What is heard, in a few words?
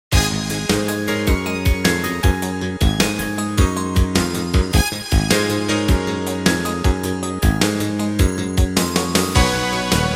Big City Beat